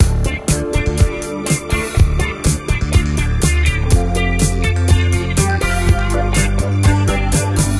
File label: Disco; Diner Dash Hometown Hero